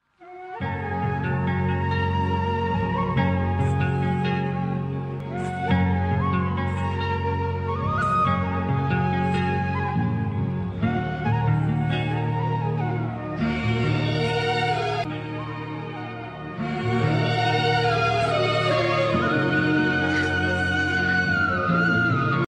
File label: bgm telugu; murari movie